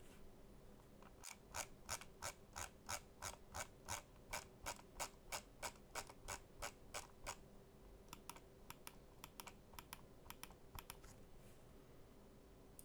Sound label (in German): Mausrad